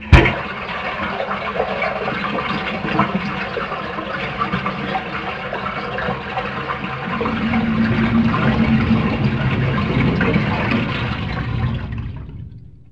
bath mix

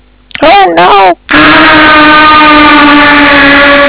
oh no aaah

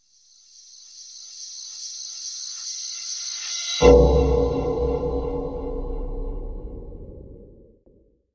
Dead Sector, ambience

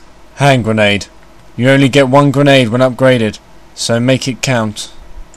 hand gren; ns training

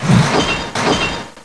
miss

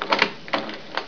lock

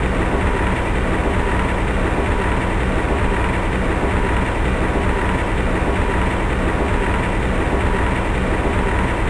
generator running

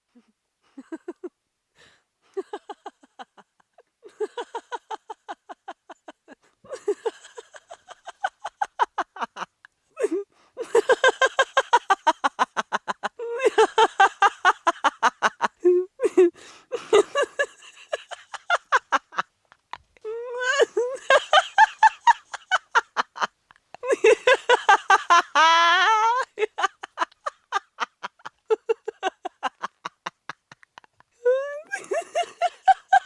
laughing

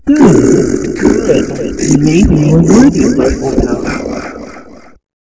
ice queen powah; npc

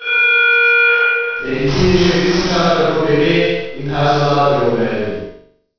speaker

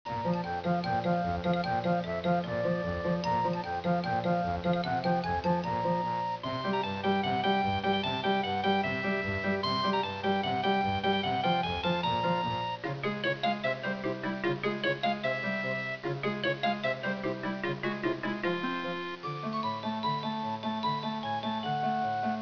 circus tune

